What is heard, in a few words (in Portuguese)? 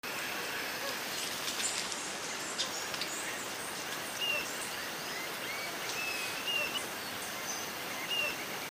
canto do anambe azul